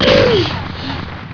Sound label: fall; Alien Arena; drfreak